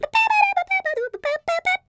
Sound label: SCAT; Spectrasonics; Vocal Planet; Jazz FX; CHEESESCAT